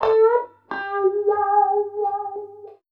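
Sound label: guitar, Roots Funk; USB Soundscan vol.41; AKAI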